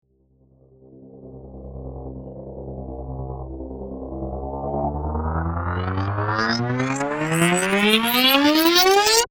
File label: Synthetic Swell